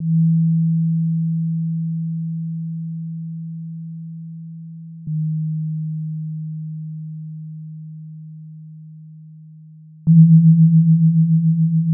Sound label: wolf